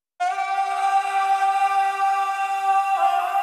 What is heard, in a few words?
Choir